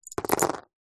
leisure board game tokens metal handful set down on playing board; monopoly